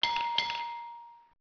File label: bim bim